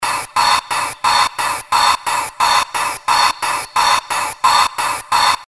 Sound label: funny machine fx